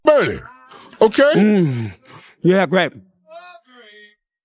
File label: yeah great vocals